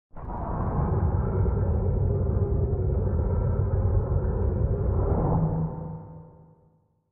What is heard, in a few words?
dive, underwater